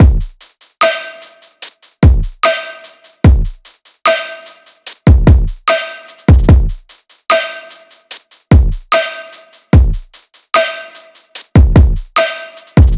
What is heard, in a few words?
drums 148; Full Drum Loops; Slate; SEISMIC Drum Sample Pack